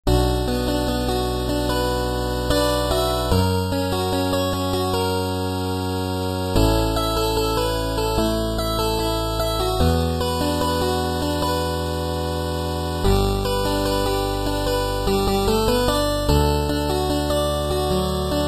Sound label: 8-Bit